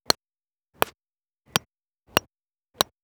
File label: golf club putter mono; soundscrate